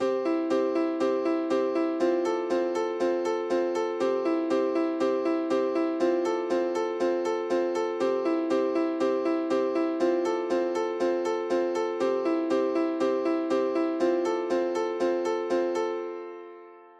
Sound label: piano